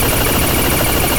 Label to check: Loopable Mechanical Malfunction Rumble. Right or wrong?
Right